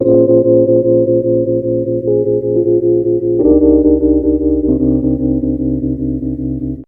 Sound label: ON THE JAZZ TIP